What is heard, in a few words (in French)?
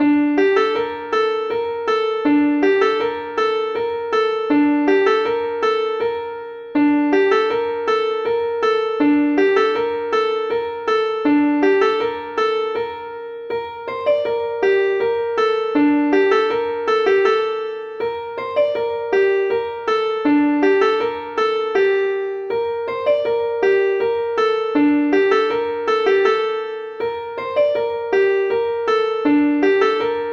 suite hanter ancien